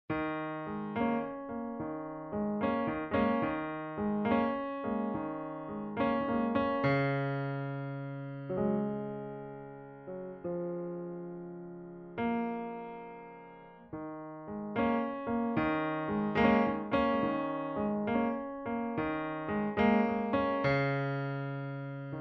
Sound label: Piano